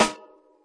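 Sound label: snare, Classic Hip Hop; STYLE KITS; Classic Hip Hop Mega Kit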